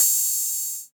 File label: perfect open hi hat a sharp key, open hi hat one shot tuned to the A# note